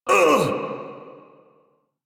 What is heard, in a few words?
Pain Lich D; Kuva Lich